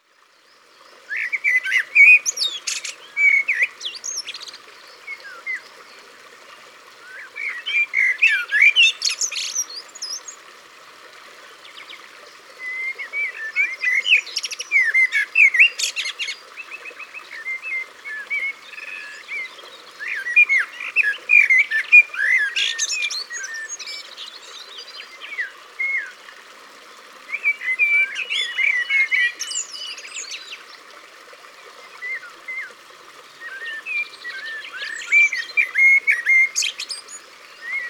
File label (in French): Merle noir, Au bord de l'eau